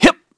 Vox Jump; kingsraid; en

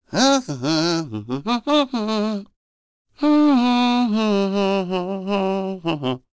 vox Hum; kingsraid; en

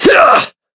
Attack, Vox; en; Shakmeh; kingsraid

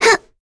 Vox Jump; Lavril; kingsraid

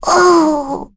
Vox Damage; Jane; kingsraid; voices heroes

